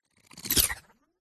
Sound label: Futuristic Technology and UI Futuristic Whoosh